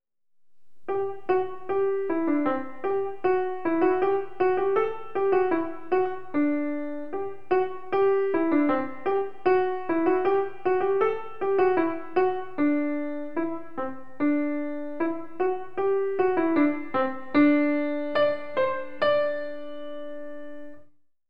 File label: Hungarian March